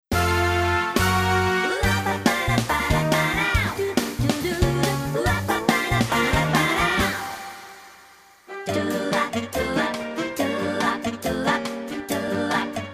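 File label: backing track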